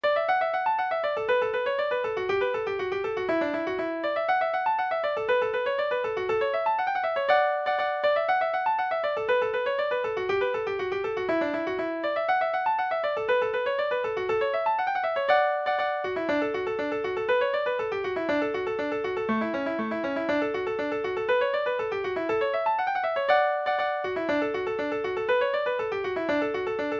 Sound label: hornpipe, session tune midis; durangs